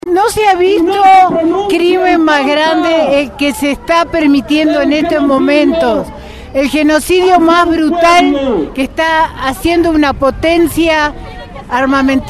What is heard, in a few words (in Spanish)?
marcha; palestina julio 2014